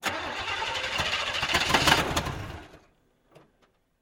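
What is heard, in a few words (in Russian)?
zvuki startera avtomobilia, car starter sounds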